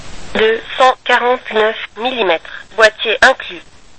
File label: French Tape Measure